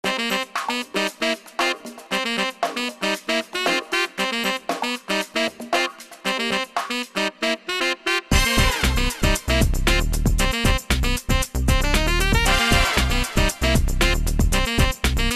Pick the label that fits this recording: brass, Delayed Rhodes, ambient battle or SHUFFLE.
brass